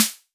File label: drum hit whistle; osu